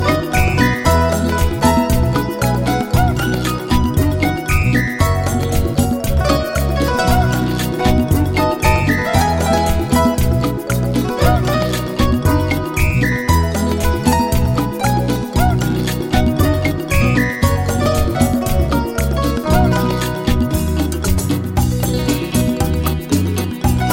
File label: Beguine of Love